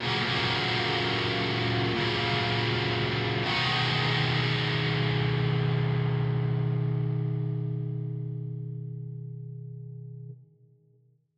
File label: SONG GUITAR AUTOMATION ON; KONTAKT LIBRARY